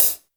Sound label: HiHat, Roland R-70